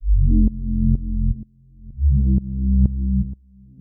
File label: 126 F C BASS, Funky; Loopmasters; Funky Underground Vol 2; BASS LOOPS